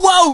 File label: hurt